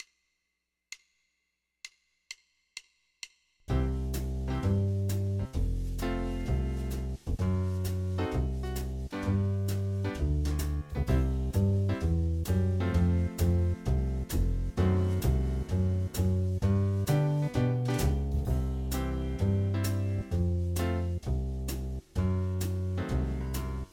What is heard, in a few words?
C F Fdim7 G; guitar